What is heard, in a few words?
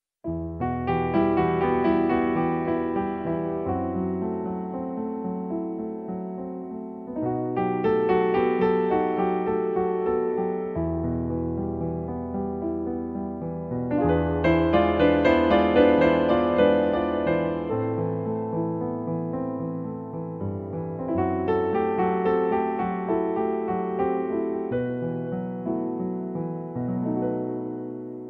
piano cover